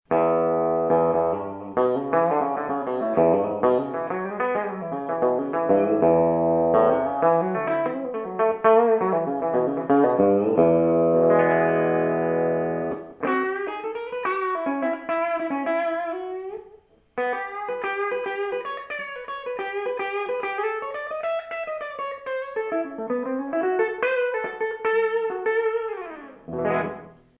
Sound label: black bridge, guitars; Standard P-90 Bridge